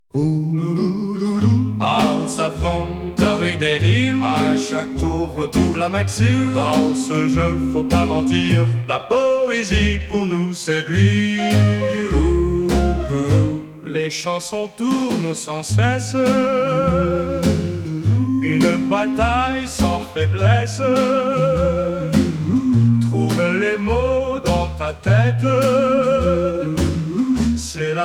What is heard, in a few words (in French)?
musique jeu